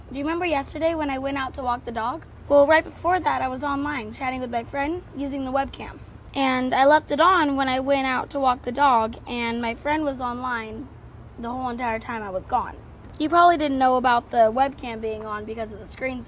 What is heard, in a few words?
RINGTONE